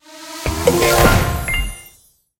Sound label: pick n click; vegas night life